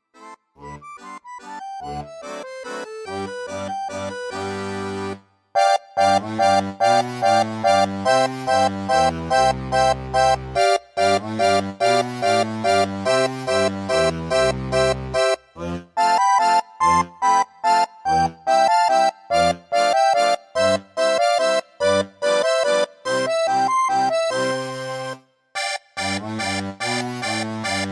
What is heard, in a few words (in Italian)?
La Banda, valzer; Czerny